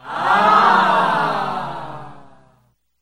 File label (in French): aaah